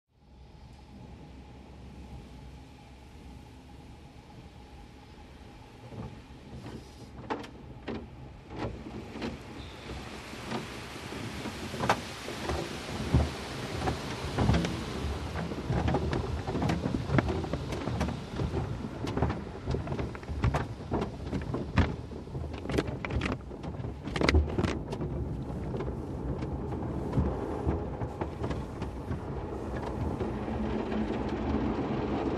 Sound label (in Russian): zvuki avtomaticheskoj avtomojki iz salona avtomobilya